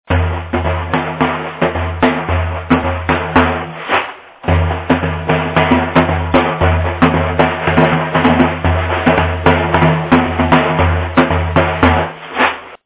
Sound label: daf